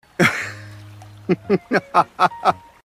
Laugh; Sylus

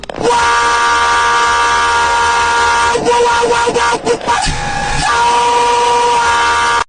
WHAAAAAAAAAA

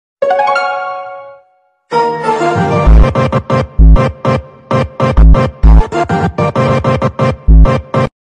Funk Brasil; Clash

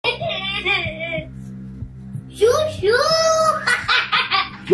Chuchuuu Hahaha

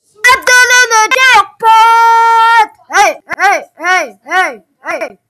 Boy Singing Jackpot

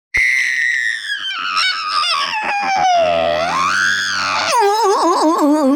Happy High Pitched Scream

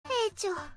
Sneeze; Osaka

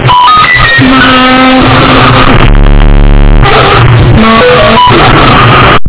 Android Notification Bass Boosted

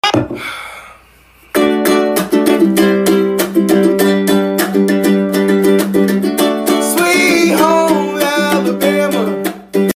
Ukelele

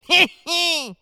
Cheer; Minion

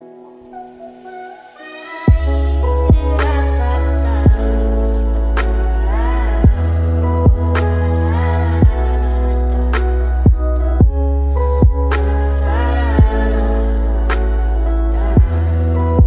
Sad Viral Instrumental Music

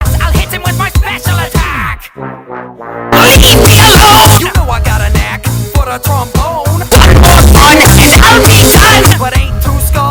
Leave Me Alone Music

